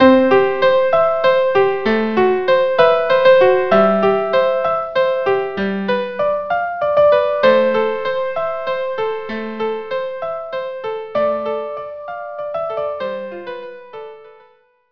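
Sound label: Ave Maria violin violin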